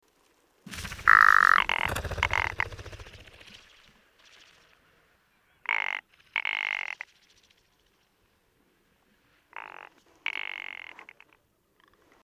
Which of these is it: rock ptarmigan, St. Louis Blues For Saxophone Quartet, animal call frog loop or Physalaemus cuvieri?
rock ptarmigan